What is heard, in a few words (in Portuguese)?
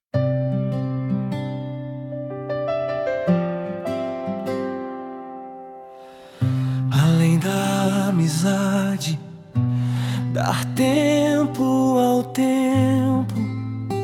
pop romantico